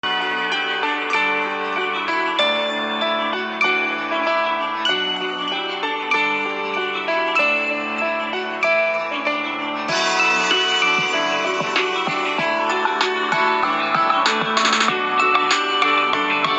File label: Ode to Joy Remix